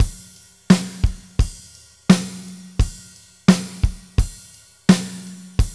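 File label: pop ballad, Roland drum beats; Northstar - Drumscapes Roland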